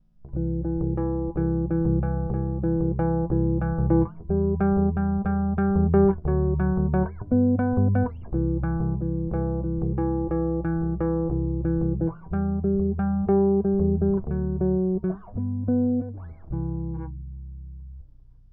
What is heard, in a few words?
Bass Chords, Preamp